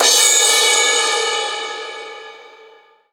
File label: Crash, Cymbals; Vengeance Samples